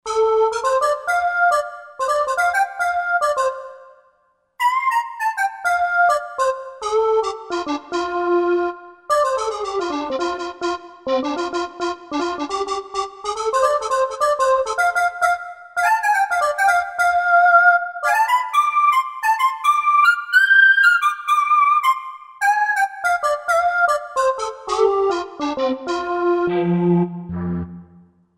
Solo Vox, Roland JV-2080

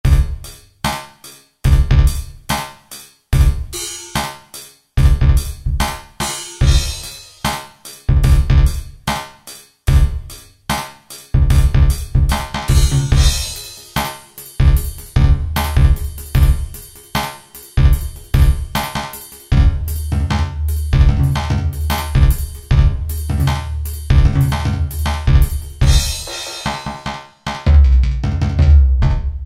Vocoder Kit, Alesis QS8; Factory Preset examples

